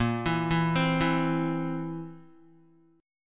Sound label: Bb Suspended 2nd Flat 5th Bb down Guitar, Bbsus2b5 Chord; arpeggiated